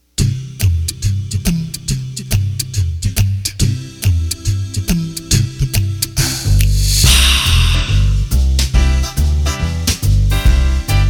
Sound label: Swing, accordion; roland bk7m